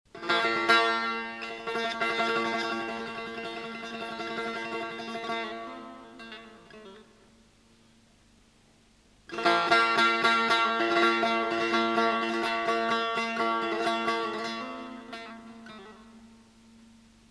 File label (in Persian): Taknavaziye Setar